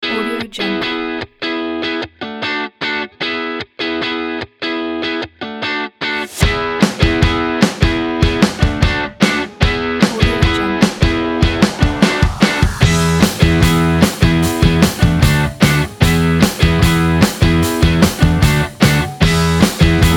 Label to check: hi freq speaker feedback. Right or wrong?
Wrong